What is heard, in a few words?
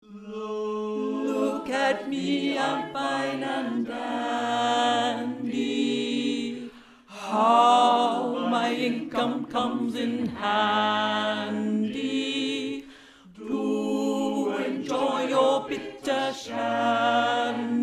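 tenor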